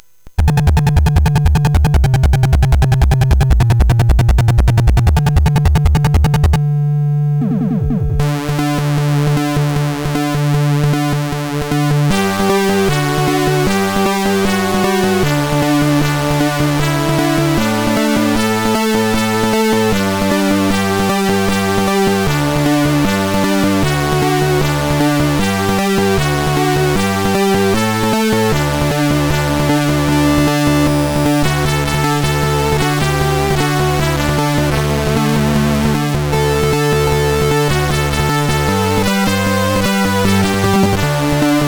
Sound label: Orchestra CSG8580R5; Orchestra Demo 1